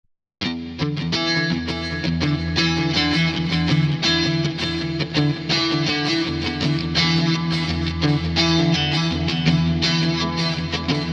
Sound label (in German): DI Aufnahme EQ 6 kHz an Plug in